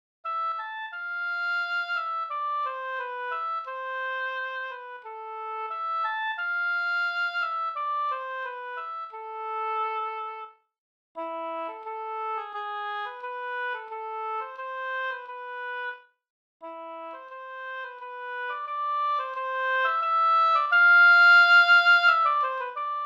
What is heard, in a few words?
Oboe